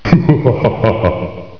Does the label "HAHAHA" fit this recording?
Yes